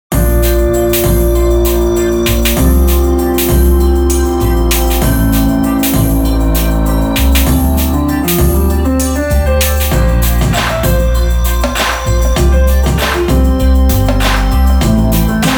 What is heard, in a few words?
grateful breeze